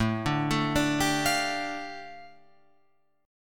A 7th Suspended 4th Sharp 5th, A, down, Guitar, Open F; A7sus4#5 arpeggiated